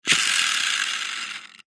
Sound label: leisure rc car wheel force spin by hand